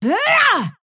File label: effort melee big